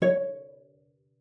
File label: cello pizz C#5; 90 s Sample CDs; Xsample VOL-5